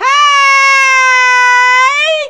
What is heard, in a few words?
hey female long pain; ooh ahh